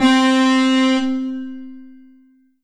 Ensoniq VFX-SD Scream Lead C4

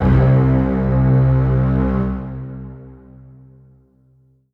Ensoniq SD-1 Strings C4

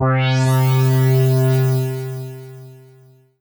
Korg MS2000 Thin Pad C3

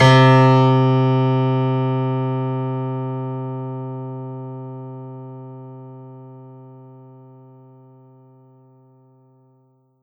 Roland SC-88 Grand Piano C3

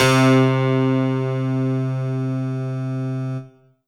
Alesis S4 Plus Harpsifunk C3

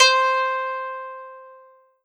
Roland SC 88 Koto C5

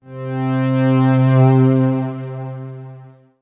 Korg M1 Pad Ana C3

